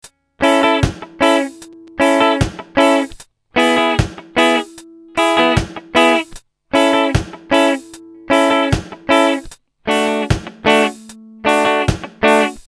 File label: reggae; reggae rhythm guitar; Jamaica